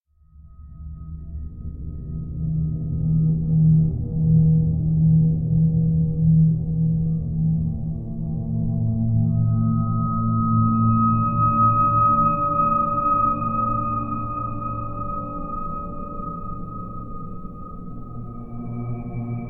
Drone Up & Down Scary - Long Moaning Musical Pad With High Overtones; Hollywood Edge; sound designer's toolkit v2